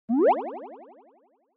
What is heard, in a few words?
Anime Motion Delay